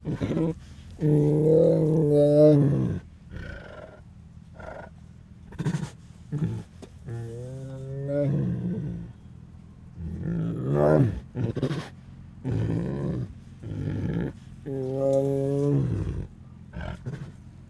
3 Year Old Siberian Tiger Chuffs; Tigers; Sound Ideas

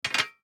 DICE on Metal, Drop, Standard, Four Dice; Samples; ArticulatedSounds; DICE Sfx Party (Extended)

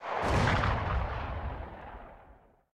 gunshot echo; Blind Drive

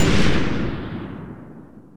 Assault Rifle Shot Loop End; Weapons

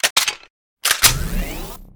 Gun Reload Scifi, Weapons Foley; SFX Kit

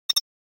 Button Loading Beep - Double; Hollywood Edge; sound designer's toolkit v2

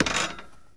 Street Ball Wood Back; SFX Kit; Street Basketball

